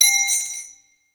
victory ring drop; smite; chiron

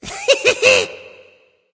heh heh; smite; ahmuzencab

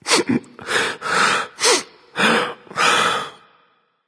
boohoo; smite; merlin

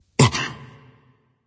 breath; smite; voicepack; xingtian

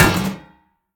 shield drop; smite; lancelot

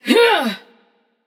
grunt attack passion; chaac

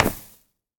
Clap; Smite; god Kumbhakarna

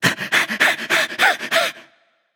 breath; smite; voicepack; hachiman

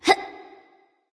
lift grunt; smite; awilix

